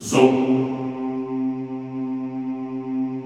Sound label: ZOOMMM C2, Classical Choir; Best Service; Extended Classical Choir